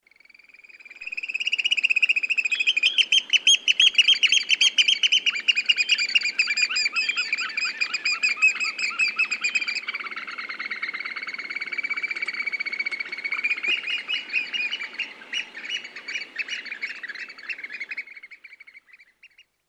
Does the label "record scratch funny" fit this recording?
No